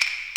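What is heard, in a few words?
CLACK, JAPAN DRUM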